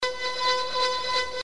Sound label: Bell